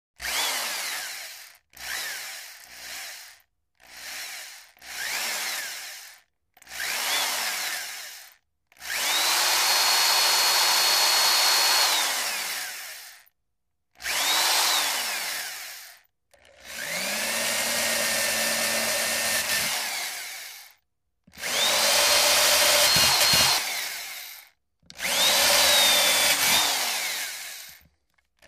Drill Electric Hand; drills

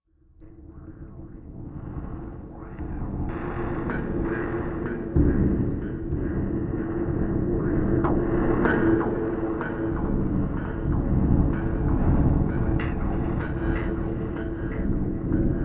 Making Contact Deep Space Wind Distant Echoes

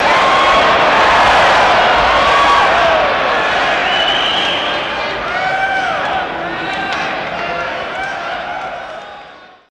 Crowd Yeahs Intro, big arena

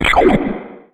Button Disappear

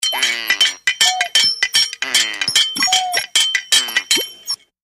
Weird Little Quack, machines

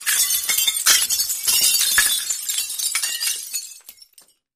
Glass Falls Medium; windows & mirrors

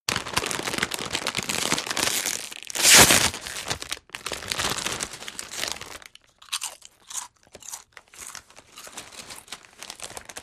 Potato Chips Bag Rip, kitchens

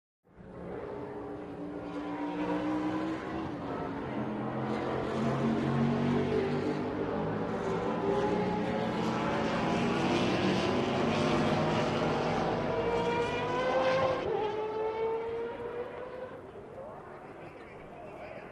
Sports Car Race Pit

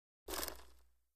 Roller Blade Spin; roller blading

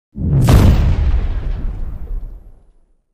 Explo Shrt, explosion

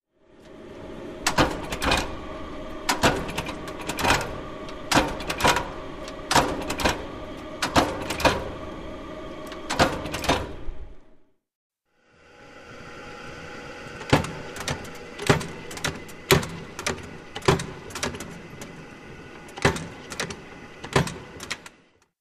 Industrial Machine, factory

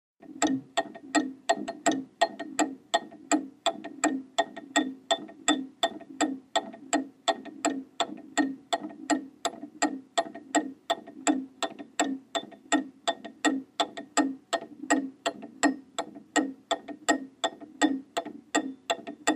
Old Mantle Clock Tic

